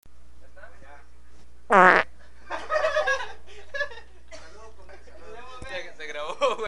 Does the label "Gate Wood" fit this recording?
No